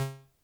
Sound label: ping; sundance